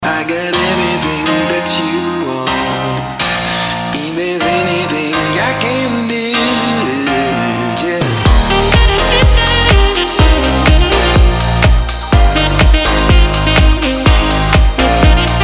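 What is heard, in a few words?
saxophone house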